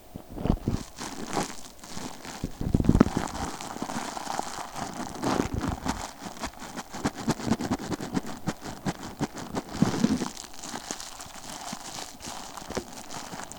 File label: foil wrap